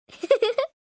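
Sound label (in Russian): короткий смех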